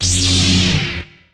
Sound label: e blade on; civ13; civ14; Weapons